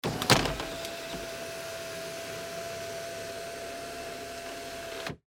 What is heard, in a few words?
Car Sunroof Open